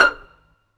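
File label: strings F1, String Pizz, AKAI S-1000; Samples vol.55 - Retro Sampler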